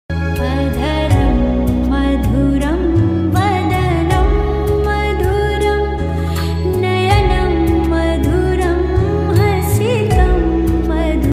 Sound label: krishna ringtone